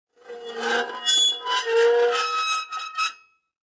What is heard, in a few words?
Metal Scrape On Metal